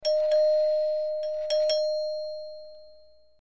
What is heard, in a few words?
Single Tone Door Bell